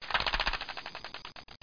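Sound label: shade flap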